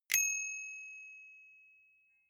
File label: bicycle street bell